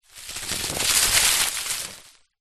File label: stick drag scrape dried leaves forest floor; foley